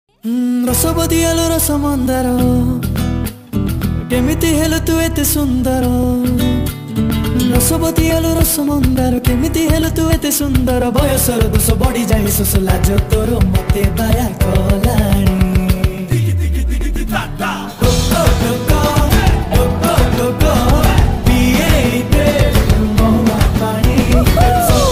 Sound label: dance odia song